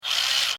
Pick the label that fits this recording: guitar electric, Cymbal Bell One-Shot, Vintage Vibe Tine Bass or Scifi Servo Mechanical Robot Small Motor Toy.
Scifi Servo Mechanical Robot Small Motor Toy